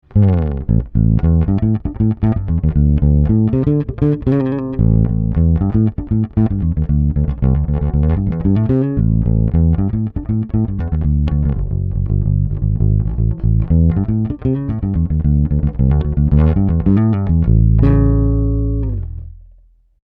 e bass fast odd; Dave Hill Designs Europa 1

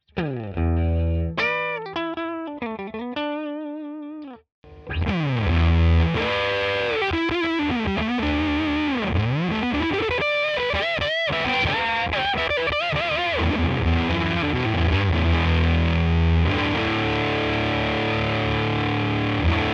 Walrus Fundamental FUZZ Mid Lead

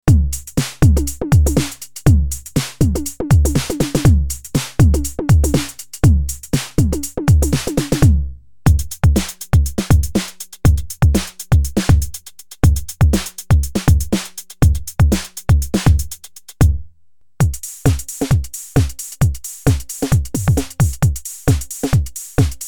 uptempo

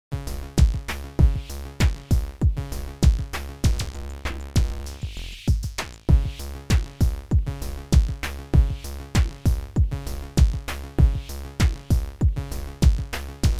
Microtonic Pattern; Sonic Charge

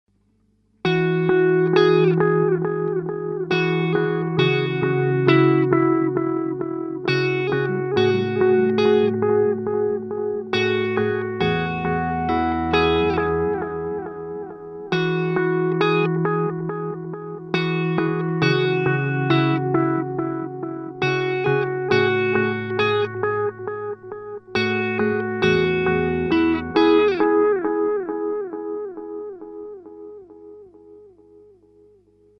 Seymour Duncan Vapor Trail Long Delay

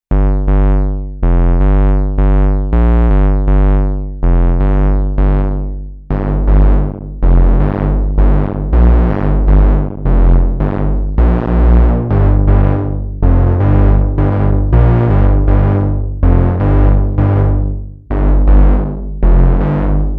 Bass; Dick

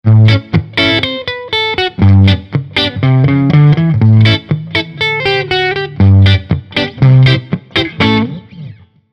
Hagstrom D2H Neck CL

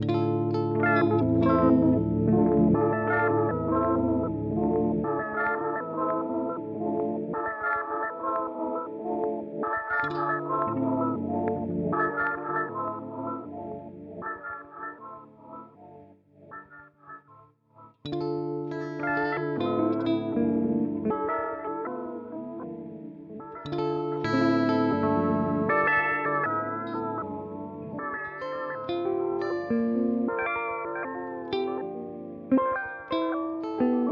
Thermae Modulation Step; Chase Bliss Audio

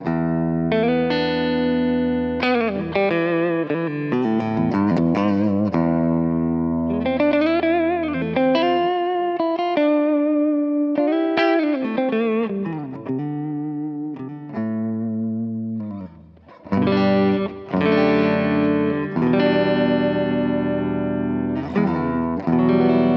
Compressor; Walrus Audio Mira; Undercurrent

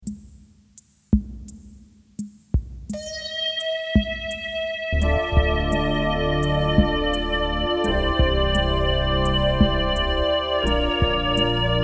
Pro 12 Epic

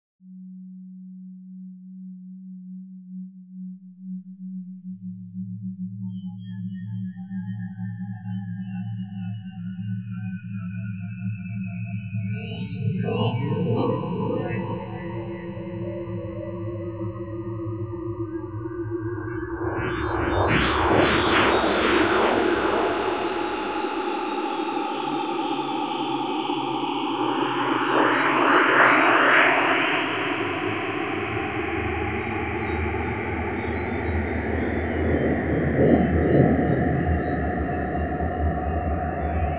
Virtual ANS 3 Electricity

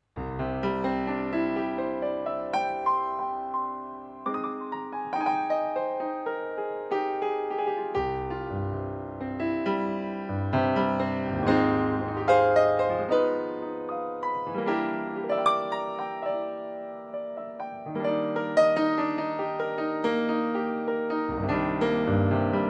Japanese Grand Piano; Kronos

